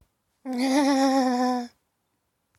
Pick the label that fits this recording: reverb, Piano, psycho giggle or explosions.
psycho giggle